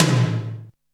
R8 Tom, Roland R-8

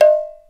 INDIAN, DrumBase; Crypton - DrumBase5000

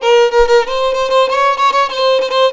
VIOLON A, Indian Traditions; USB Soundscan vol.45; AKAI

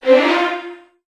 VN FX, Virtuoso Strings; ILIO; Kirk Hunter

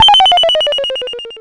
BOSS PERC SNTH, DRUMS PERC; Rubber Chicken Software Sample Bank

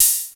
ELEX HH CL, HI-HATS

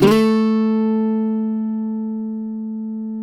CUATRO; Spectrasonics; Hans Zimmer Guitars VOL-2

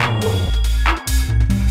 High Ride Clap, Synchro Funk, Beats; Sonic Foundry (Sony Creative Software)

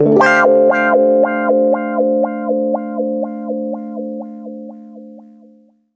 120 WAH PAD, Electric & Acoustic Guitar Loops; USB Soundscan vol.04; AKAI

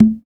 CNGO, African Perc; Spectrasonics; Supreme Beats; African Contemporary; SB Afro DRMs

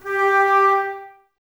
Flute, Woodwind; Miroslav Vitous - Woodwind Brass Ensemble

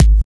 Kick, Kickdrum; Equipped Music; Loungin House

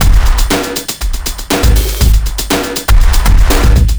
Noise Beat; Drums & Drones

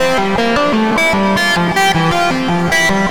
Riff, Synth Sequences

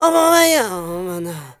Zulu male, Heart of Africa, male dry; Spectrasonics; Heart of Africa 2; S.MALEDRY

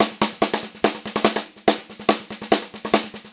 144 SPEED, Pop-Rock Drumloops

